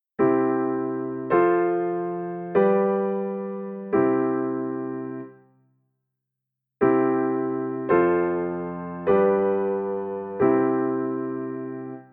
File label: Key of C I IV V I